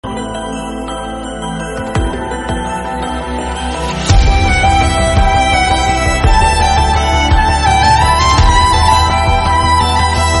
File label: kvest melody